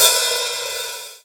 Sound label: roomy open high hat a sharp key